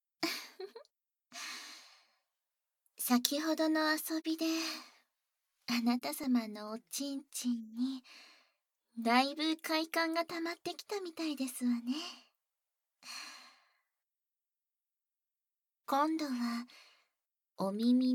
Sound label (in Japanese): 耳舐め 手コキ（バイノーラル 効果音あり）